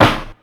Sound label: Snare, Ensoniq ASR-X Pro